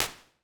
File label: Early Reflections Small Ambience, Impulse Responses; Ableton Live 12 Lite; Hybrid